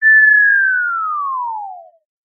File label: cartoon fall whistle short